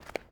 Paso sandalia piedra

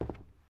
Paso zapatill madera

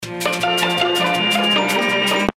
Musica de espera; SportShots

